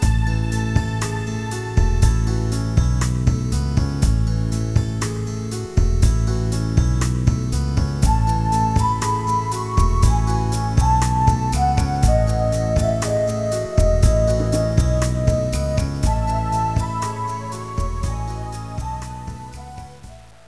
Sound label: spring; kyrandia3; Westwood